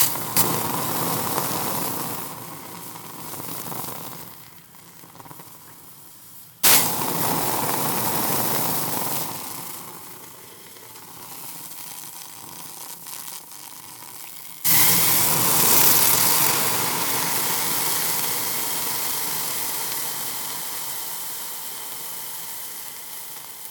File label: Cold Waterdrops In A Hot Pot